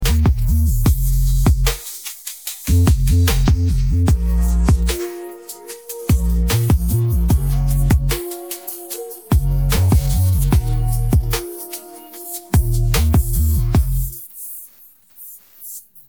no vocals

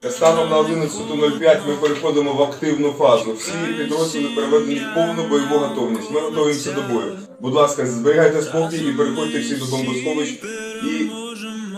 vocals

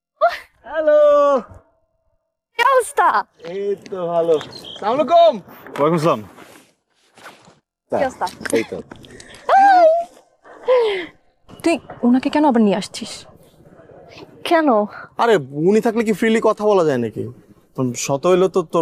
vocals

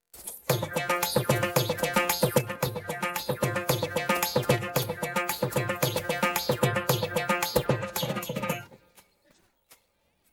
happy no vocals

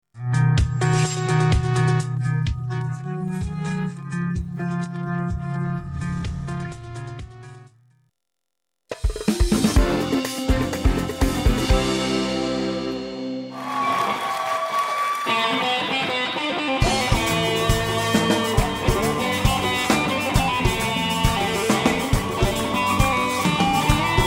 no vocals, rai; sawt live

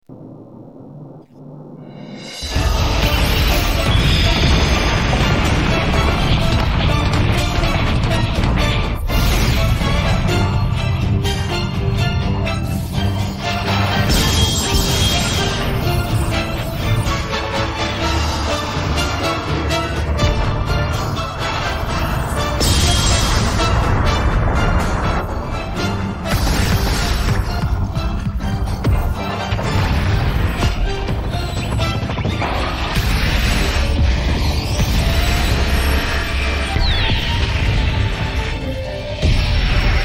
goku v hearts instrumental maybe

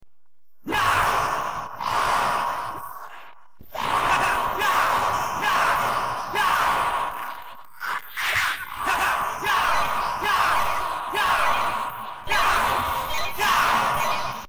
vocals

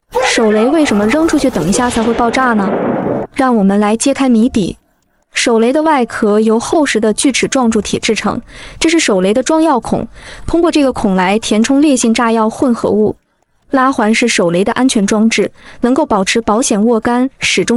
vocals

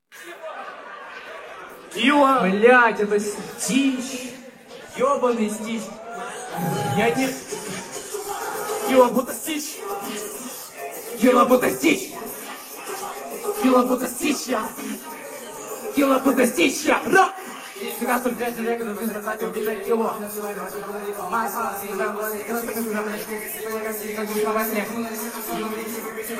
vocals

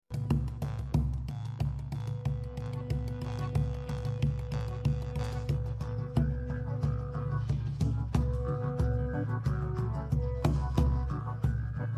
Traditional Celtic Song no vocals; lal, lal, ars’ a’chailleach

